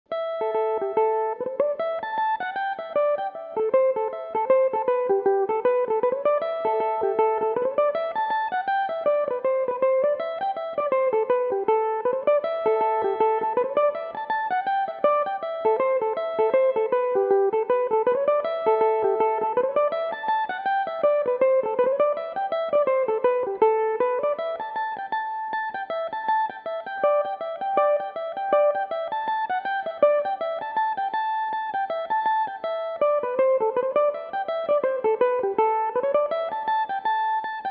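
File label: congress reel A dorian, Irish mandolin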